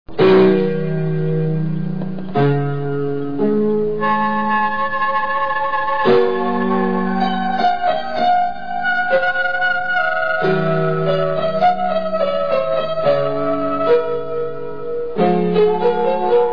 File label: piano